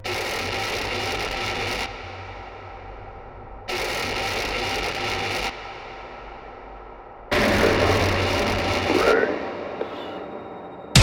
noise drum mix

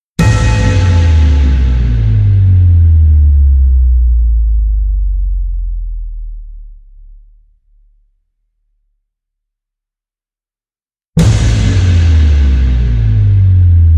downers bass boss

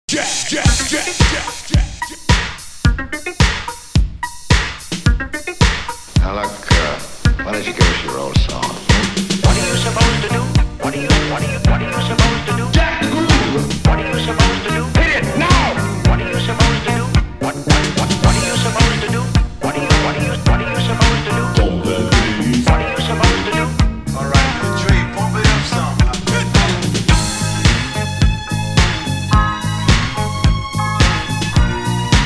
instrumental version

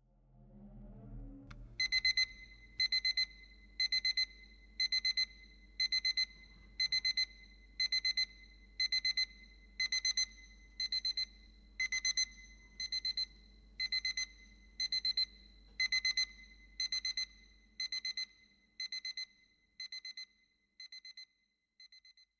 classic alarm

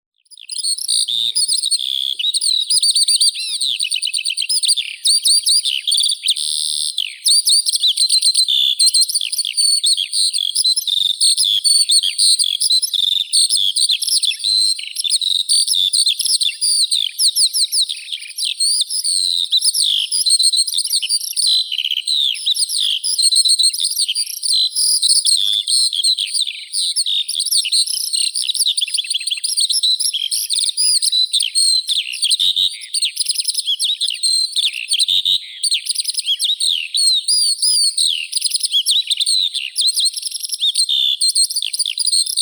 zhavoronok polevoi alauda arvensis; zvuki prirodi

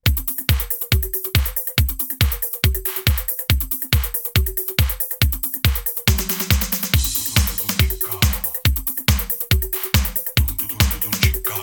instrumental

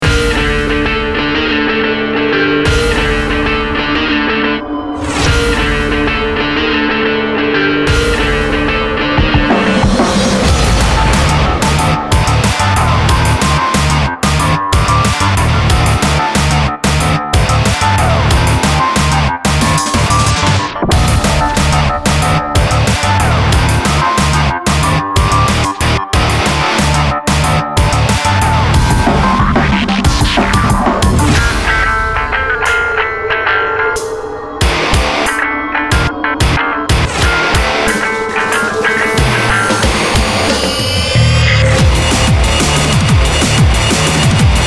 instrumental

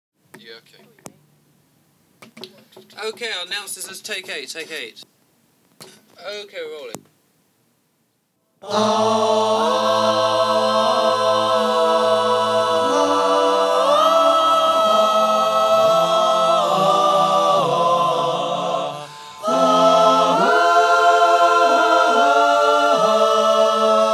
vocal mix

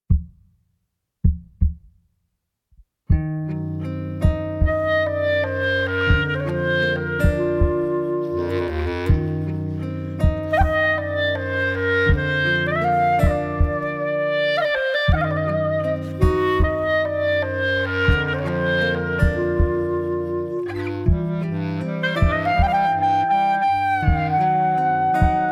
outro clarinet

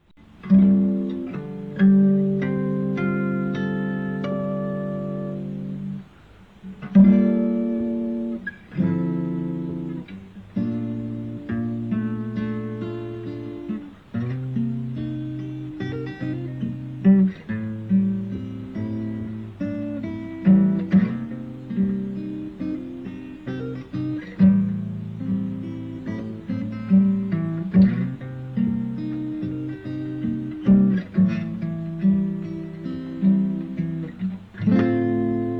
original acoustic

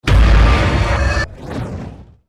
brake hit complex short

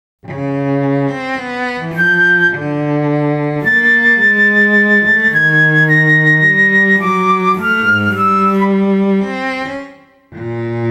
for cello and flute

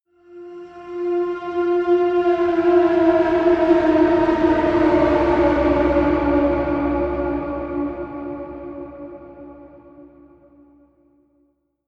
sting; archaic groan

